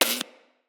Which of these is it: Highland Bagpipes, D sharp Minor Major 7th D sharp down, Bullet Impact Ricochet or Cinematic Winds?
Bullet Impact Ricochet